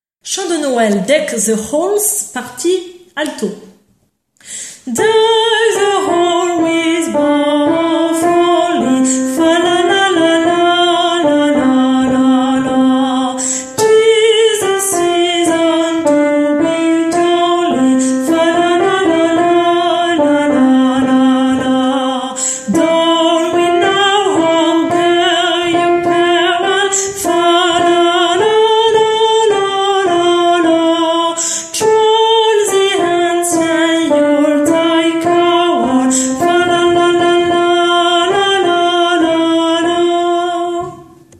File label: Alto; Deck The Halls; Traditional